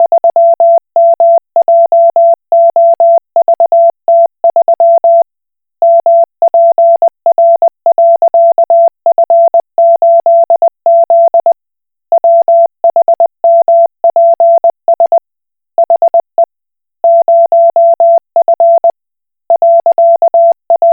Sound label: Koch Morse